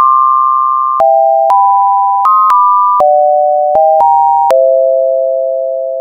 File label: Song